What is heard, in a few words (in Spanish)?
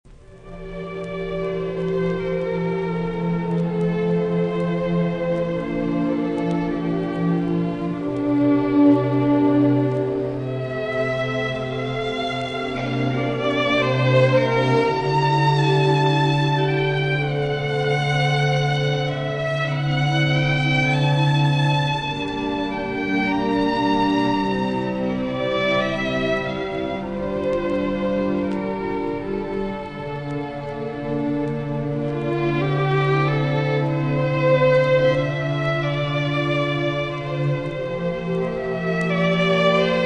Elegia para cuerdas y timbales